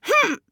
Grunt Attack; Assassin; Paladins

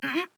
grunt lift; Skye; paladins

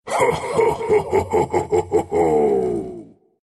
Lich laugh; Dota 2

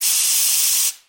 Spraypaint

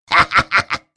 laugh; Rattletrap

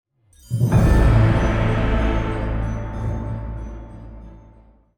Stinger loot

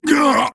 Death

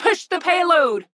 Attack

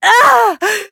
pain; Awesomenauts; Dizzy

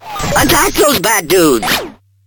attack

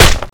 attack; Sand King

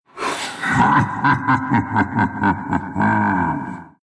laugh, ru; dota2

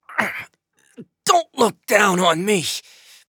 Death; Koga; Directed Lian; Paladins

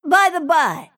move pain; Snapfire

